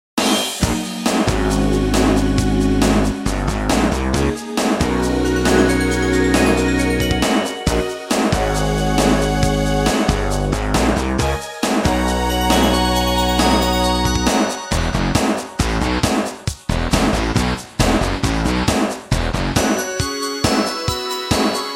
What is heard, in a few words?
Rock